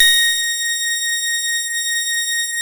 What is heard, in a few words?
A#, PIANO; Big Fish Audio; Synclav FM